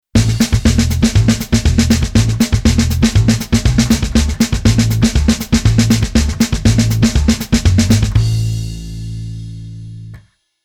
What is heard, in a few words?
Bass Arr Samba